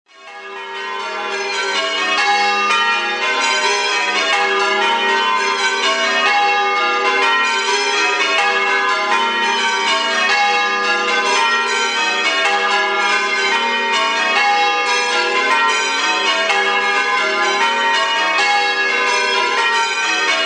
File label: stedman triples; ladock; directory towers